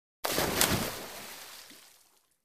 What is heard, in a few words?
Swimming Big Splash